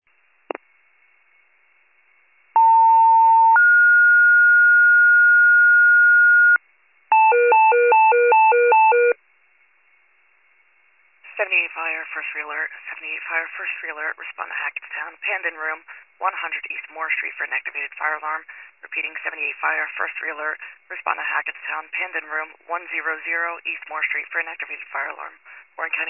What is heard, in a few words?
fire, pager alert